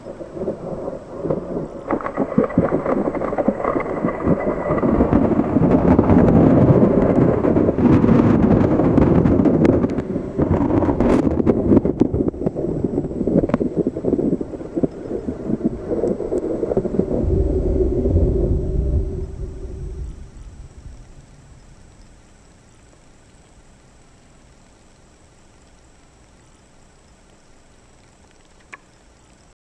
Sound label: the sounds of a giant, eruption